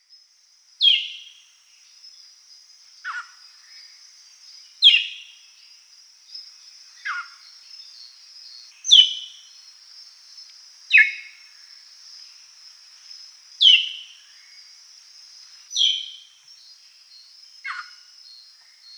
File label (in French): Moqueur corossol Pearly Eyed Thrasher, chants des oiseaux; Guadeloupe